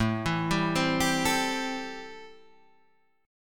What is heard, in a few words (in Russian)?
Perebor Am7